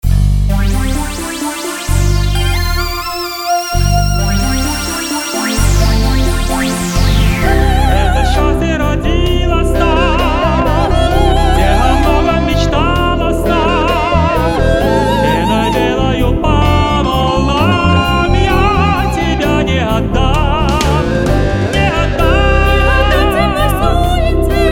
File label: Duet